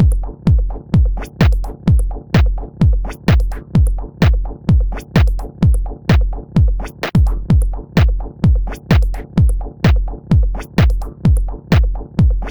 rhythm electro drum machine house minimal - gm - 128; drum loops